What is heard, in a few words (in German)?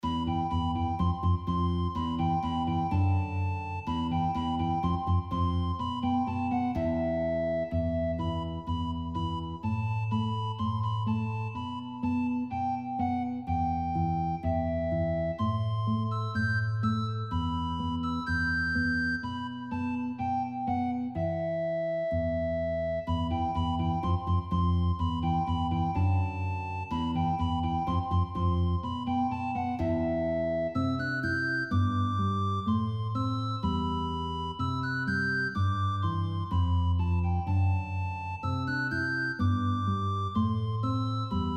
Duostucke Sopranblockflote Gitarre